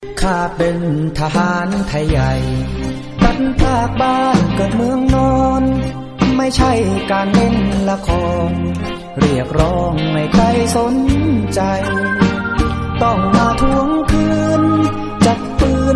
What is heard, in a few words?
Thai ver song